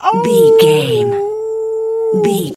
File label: wolf howl short